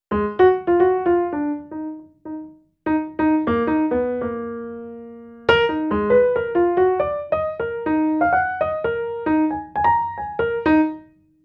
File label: Piano Solo, Cinematic Funk; Sony Creative Software; Urban Tone Poems; Master Zorn